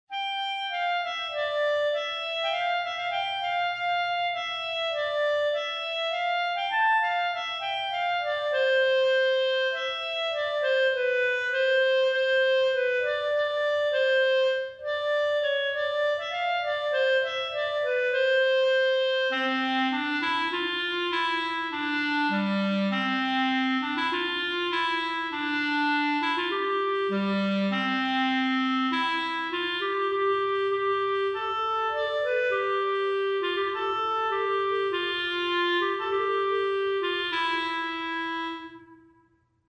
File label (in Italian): Canone a quattro voci chiuso